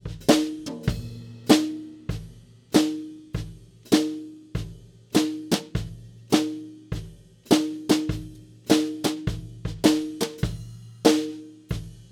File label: Snare